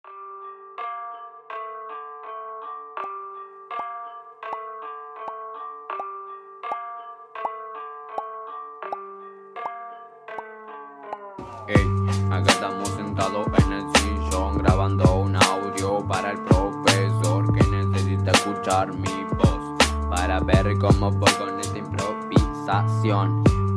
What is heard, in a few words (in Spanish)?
hip hop; Muestra virtual de estudiantes de 4° año